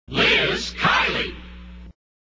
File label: jingles shout; positron